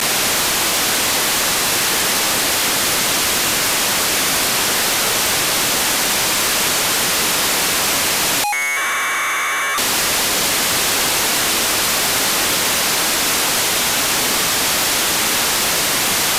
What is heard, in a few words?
Kicksat 1k2bd